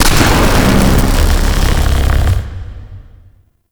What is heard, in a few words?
Electricity Zap; Ambience; Props; Warframe; Entrati